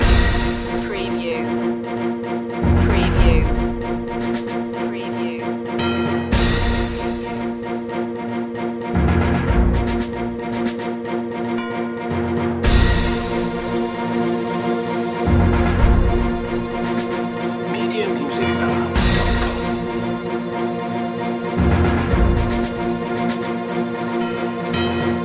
stop press loop

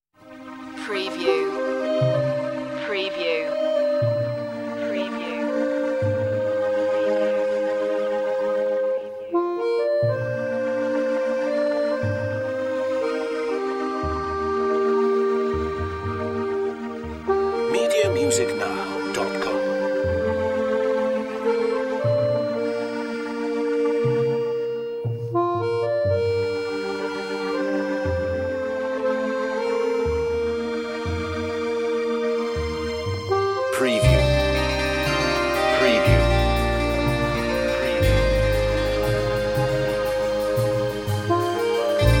mist accordeon

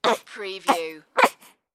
Baby Grunts

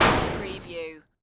Retro Scifi Hit

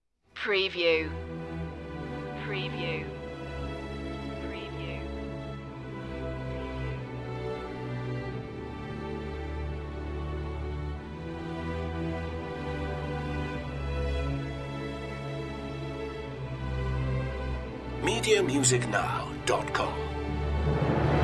intro part no choir

